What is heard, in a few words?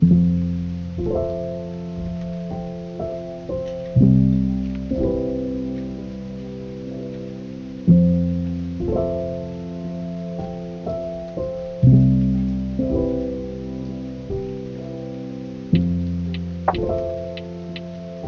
lofi hip hop